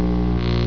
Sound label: MAGNET